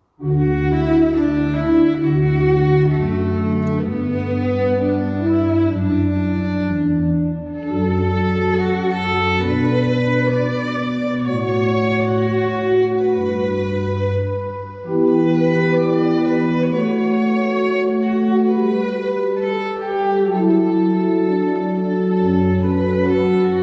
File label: org viol Celtic; mass music